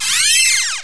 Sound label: SQEAK